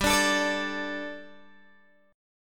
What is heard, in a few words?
G Suspended 2nd G, Guitar Open G; chordshape; down